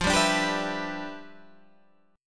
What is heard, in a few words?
G 6th F; G6/F strummed